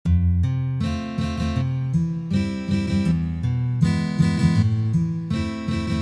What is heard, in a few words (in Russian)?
boj na gitare; shema dlya pripeva